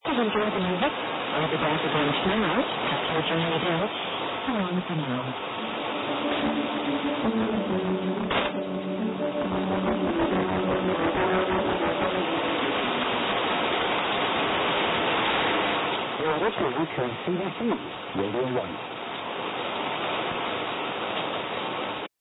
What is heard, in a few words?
6160kHz; CKZU